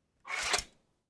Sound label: Sword Drawing